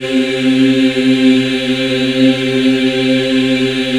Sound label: C3, Choir; USB Soundscan vol.28; Acoustic & Synth; AKAI